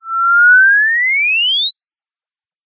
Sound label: cartoon ascend whistle high; zapsplat